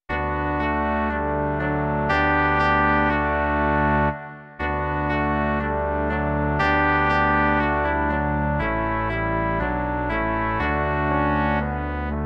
žesťový kvartet; Imaginativní verze

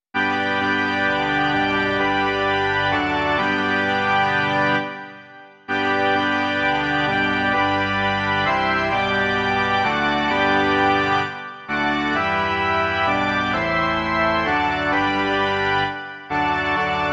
varhany; V ten den

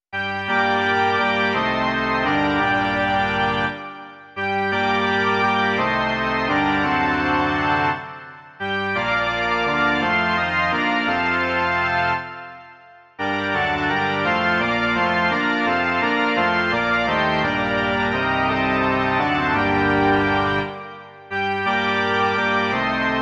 varhany

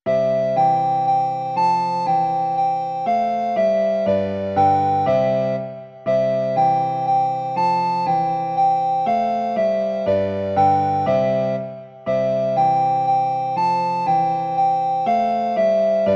2 zobcové flétny a klavír; Imaginativní verze

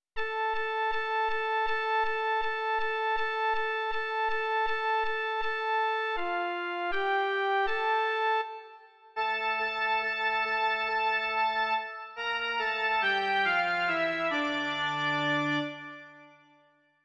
V míru a pokoji - jen melodie; Doprovody Farského liturgie

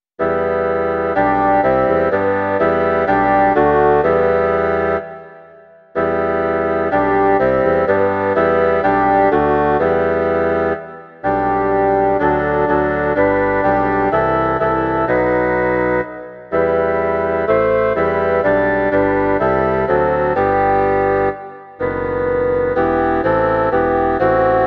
kvarteto fagotů; Ó veď nás, Pane, v slovu svém; Imaginativní verze

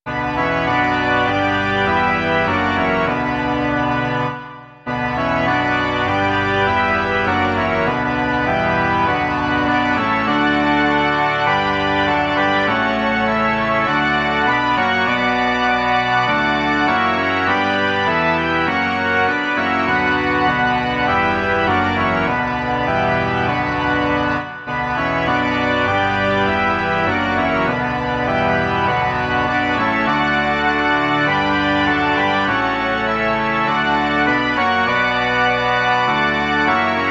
varhany; Před mírným zrakem Krista

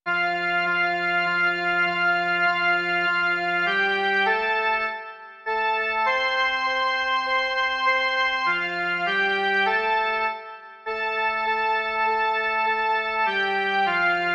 jen melodie; Ó králi věků důstojný

